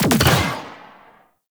Attack Drone Rifle Fire; Warframe; Venus; Venus Terra Attack Drone; Weapons

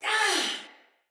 Death Finisher Soldier Female; Battle; Barks; Dialog; Sinecure Soldier Female B

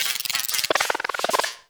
Venus Robo Fish Medium Caught; Warframe

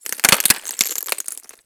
Ocean Glass Crack Small; Warframe; Grineer Ocean

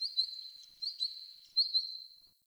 Cave Bird; Ambience; Caves; Glades Of Andurin

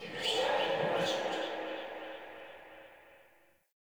Transmission Out; Fenn Jotar; Transmission In Outs

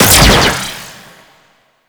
Pet Blast Fire; Alad V; Abilities

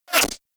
Revolver Pistol Whizz

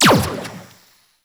shot fire; Weapons; Warframe; Akarius Prime

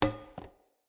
Hunter Bow Drop Arrow; Weapons; Enemy; Bows

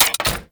Spear Throw Mech; Warframe; Corpus; Spear Gun

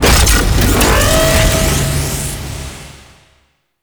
Crewship Guided Missile Warning; Warframe; RailJack; Corpus Crew Ship

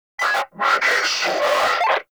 Cloak Remove Vocal; Frumentarius

